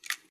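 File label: gear rattle weapon small; viper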